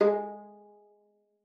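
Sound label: violin pizzicato G#3; Xsample VOL-5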